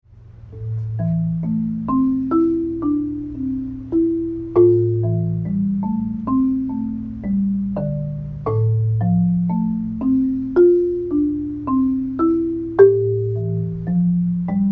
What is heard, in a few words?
marimba; improv: Marimba